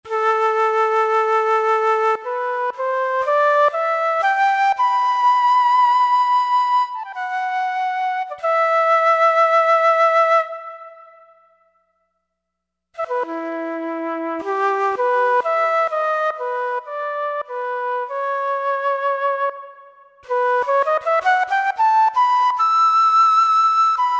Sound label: Flute Vibrato; Roland SRX-03 Studio SRX